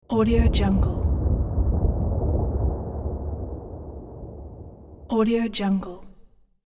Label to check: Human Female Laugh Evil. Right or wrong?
Wrong